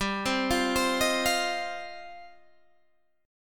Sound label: G 7th Suspended 4th Sharp 5th, Guitar DADGAD